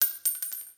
Witch Station Workshop Rattle; Ambience; Soul Plane

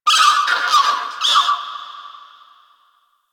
Forest Creature Mid Far; Ambience; Warframe; Grineer Forest

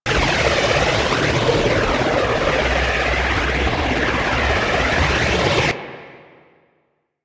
Gas City Tube Spiral Wind Loop; Ambience; Props; Warframe; Corpus Gas City; Props Remaster

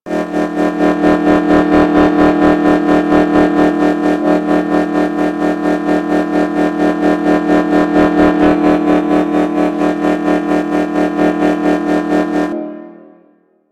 Guild Energy Shelf Loop

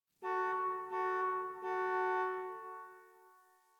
Parking Lot Distant Car Horn; Warframe; Ambience; Nineteen Ninety Nine

